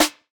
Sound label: Snare, jungle breaks drum sounds